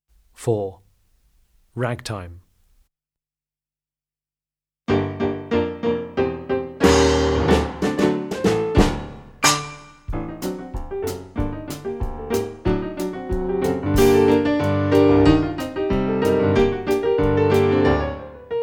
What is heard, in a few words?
Ragtime